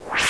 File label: DICE; VIPER Paradice